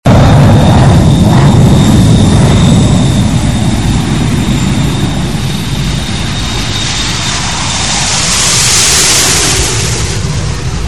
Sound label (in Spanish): tren deslizandose